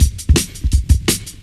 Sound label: Fat Stepz Cut, Drum Loops 167.5; Zero-G Jungle Warfare 1, 2 & 3